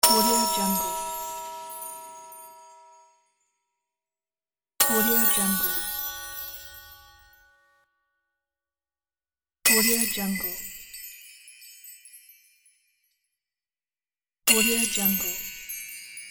christmas bells hits

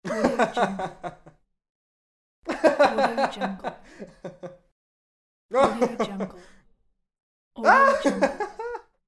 man laugh

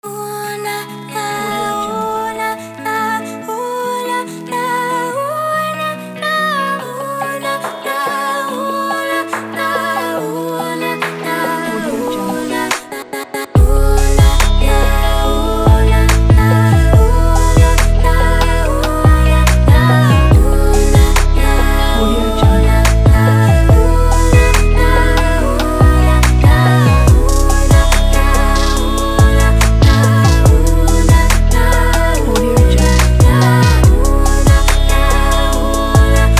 inspiring vocal guitar beat

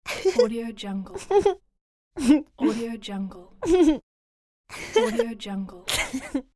girl shy laughing; joel-loopez

